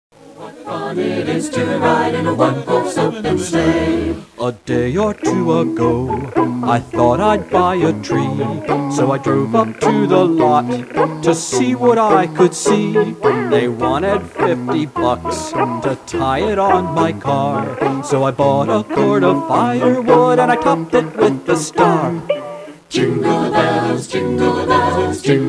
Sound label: jingle